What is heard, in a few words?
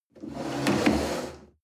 chair wood slide on floor medium bump